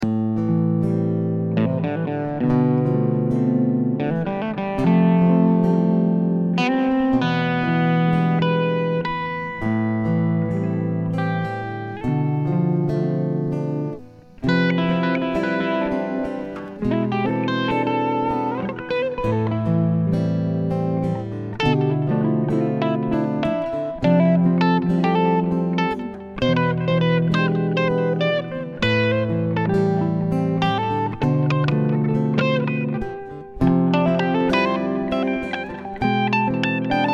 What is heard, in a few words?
Am100 Sad Chords Solo